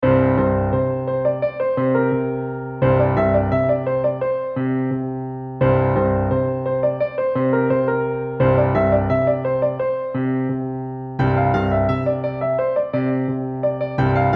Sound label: solo, piano